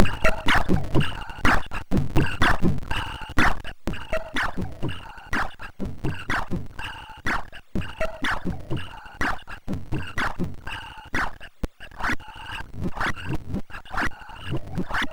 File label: Hardware Accelerated Samples; Cyberbrain